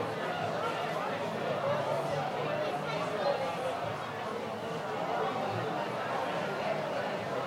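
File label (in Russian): фон люди толпа шумная болтовня говорящие голоса окружающий саундскейп шум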